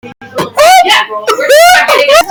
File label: uo uhh